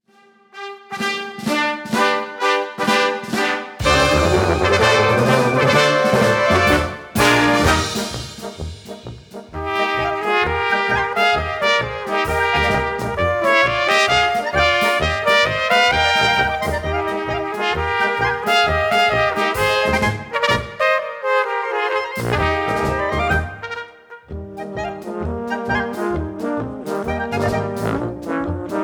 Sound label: Polka